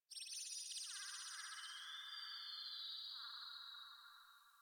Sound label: Venus Landscape Distant Corpus Texture; Warframe; Venus Open World